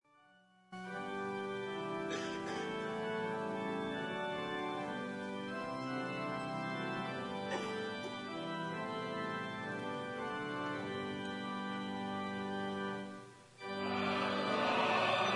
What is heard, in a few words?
choral response; worship